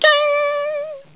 bell

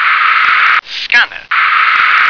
scanner

